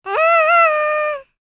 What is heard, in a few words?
LICKS, Vocal Planet Jazz FX; Spectrasonics